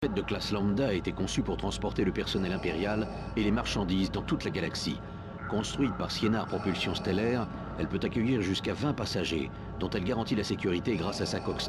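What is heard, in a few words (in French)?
Navette impériale; Star Wars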